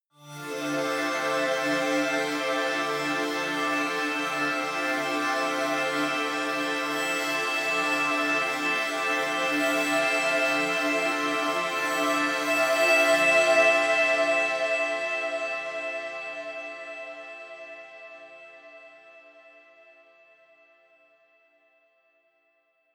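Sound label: hope jazzy, pads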